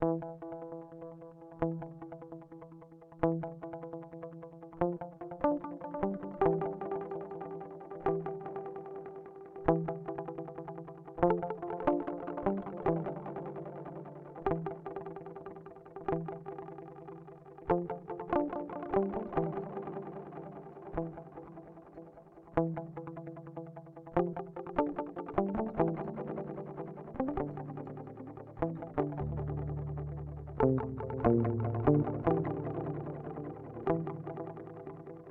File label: Timeline Stereo Dual; Strymon